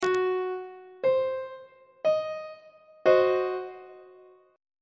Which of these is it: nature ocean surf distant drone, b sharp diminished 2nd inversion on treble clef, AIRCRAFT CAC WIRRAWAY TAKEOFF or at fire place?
b sharp diminished 2nd inversion on treble clef